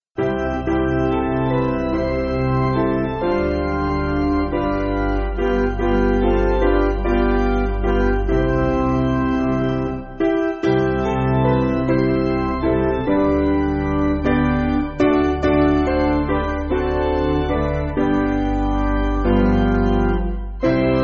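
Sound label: Organ, Pipe Organ, Music Backing Files for Churches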